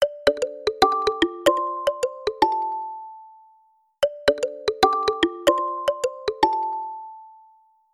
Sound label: Mountain, ringtone; xiaomi